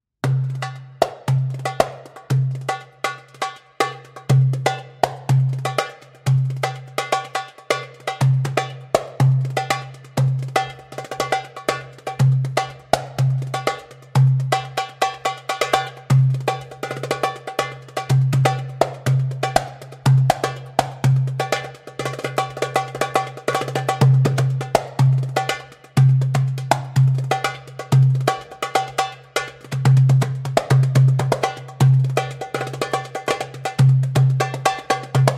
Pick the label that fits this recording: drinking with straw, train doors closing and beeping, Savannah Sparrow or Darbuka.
Darbuka